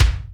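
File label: kick, Boss DR660; drum machine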